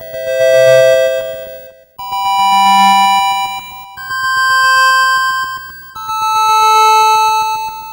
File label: Atari 2600 Synth Harmony